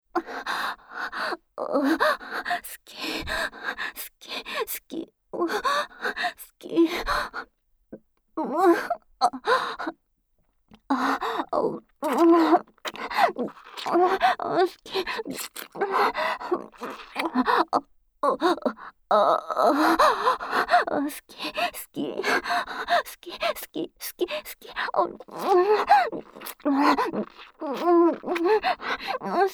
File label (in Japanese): 好き好き連呼の乳首責めセックスループトラック, ASMR